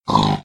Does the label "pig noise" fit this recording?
Yes